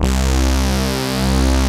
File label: edge saw, synths; Pro-Rec - Synthsonic